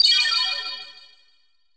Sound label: CRYSTAL, SCI FI; AKAI Anime Sound FX